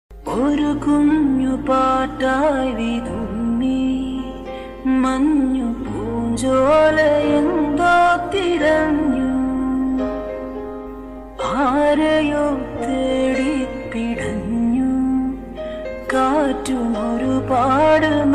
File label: sad ringtone